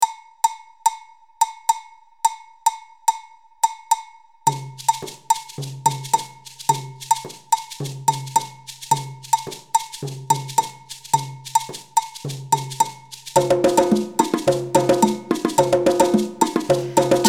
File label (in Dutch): met zang; LUSTRUM